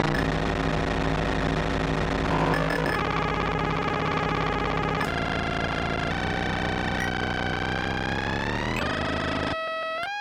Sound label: sk-2 alien modem